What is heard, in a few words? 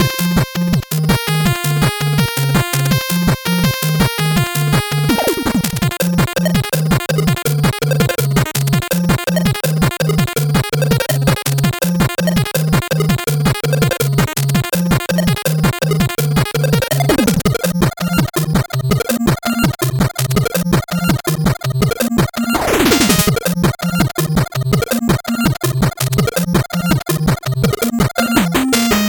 8bit bgm fast